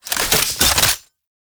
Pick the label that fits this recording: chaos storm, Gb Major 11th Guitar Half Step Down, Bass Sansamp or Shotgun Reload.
Shotgun Reload